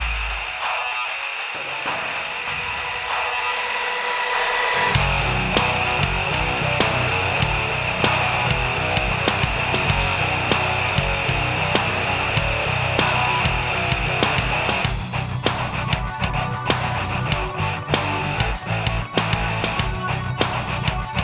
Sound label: Rock; NASCAR Unleashed